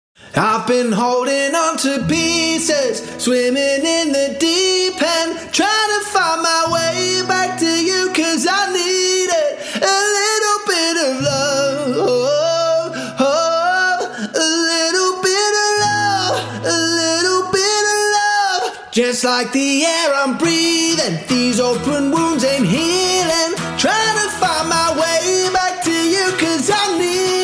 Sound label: Solo Acoustic